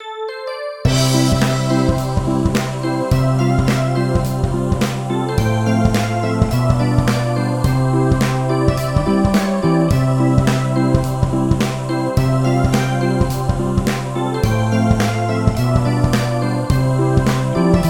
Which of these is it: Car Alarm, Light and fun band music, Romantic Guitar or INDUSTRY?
Light and fun band music